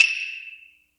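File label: Hi hyoshigi, Roland MC-303 Percussion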